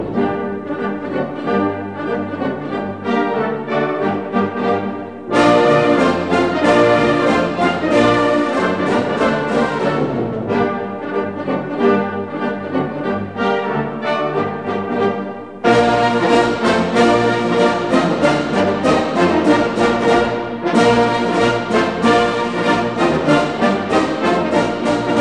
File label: Marsh Polka; Preobrazhenskogo